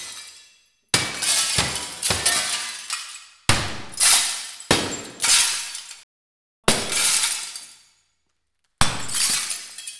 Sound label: glass breaking